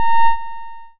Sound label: BEEP